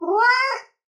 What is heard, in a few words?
cat